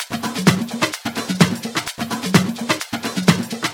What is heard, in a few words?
Percussive Loops 128BPM; Vengeance Samples